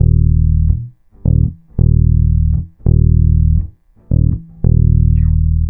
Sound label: Bass, Trip-Hop Loops; Sonic Foundry (Sony Creative Software); Rondo Brothers Trip-Hop Loops & Samples; Hard Rocks The Roof